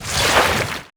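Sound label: tentacle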